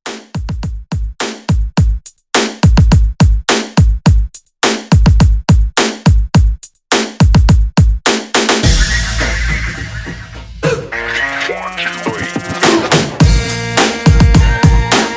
Redrum 105 Bpm

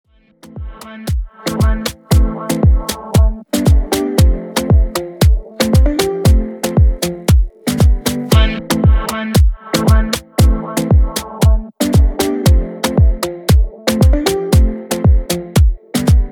Re drum

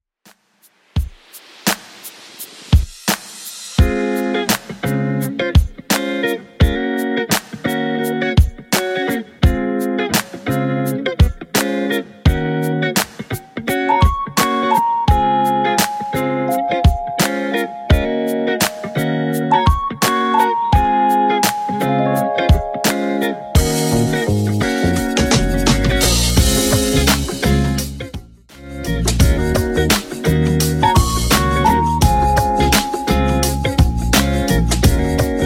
80s Redrum 85bpm

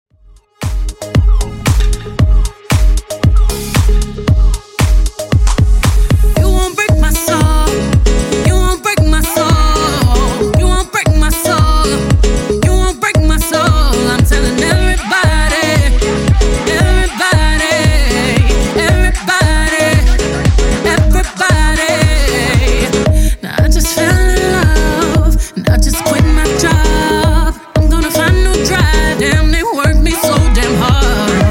TRANSITION

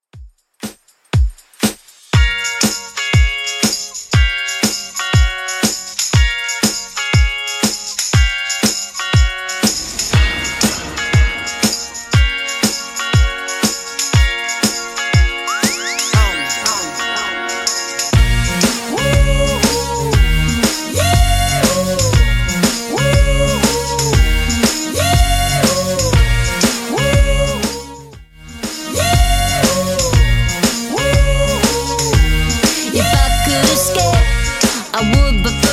2ks Redrum 120bpm

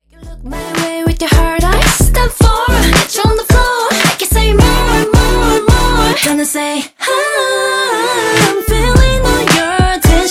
Dj Intro Outro 110 Bpm

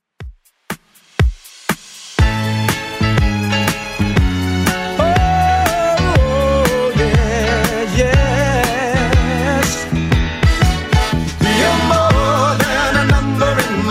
70s Dance Redrum 121bpm

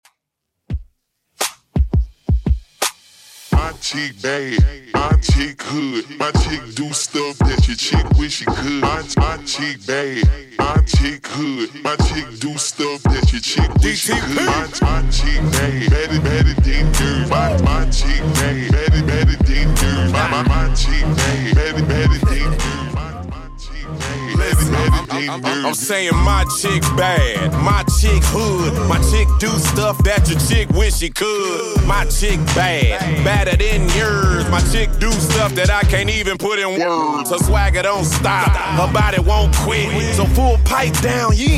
85 bpm

